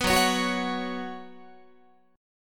Gb Suspended 4th Gb, down, Guitar; strummed

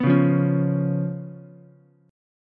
B Suspended 2nd Flat 5th B down Ukulele; strummed; JGuitar

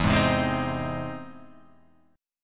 E Augmented Major 7th E, E+M7 chord; strummed; JGuitar

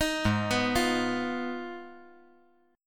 Ab Minor 6th, Ab; Abm6 arpeggiated; JGuitar

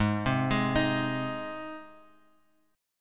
G sharp Major G sharp Guitar Open G, chord

